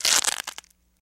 Velcro Tear Apart Not Very Fast

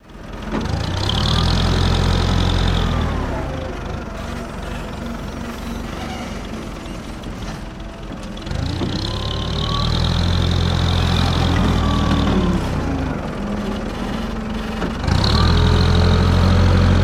Forklift Diesel Heav

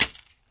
Shovel Stab Gravel

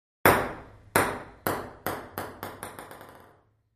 Ping Pong Ball Drop

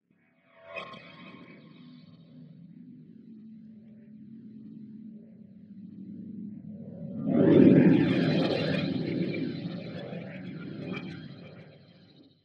Horror atmosphere creeping

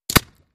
Bullet Impacts Water

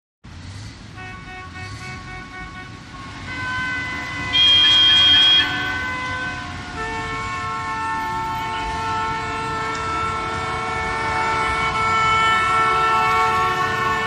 Heavy Traffic Jam; big city

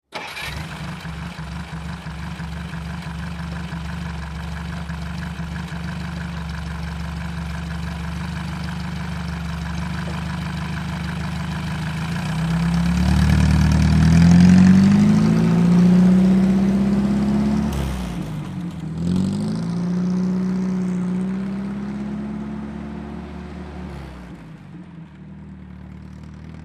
Army Jeep Start Idle S

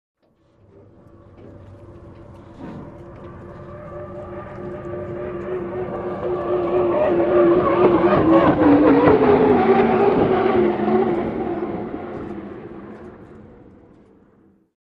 Hydroplane By Ambie

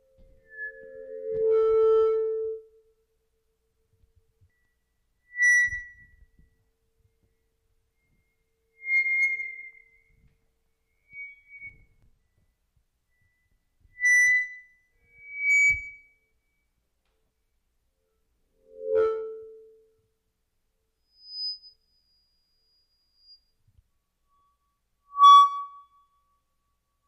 Amplifier: Feedback, Various Tones

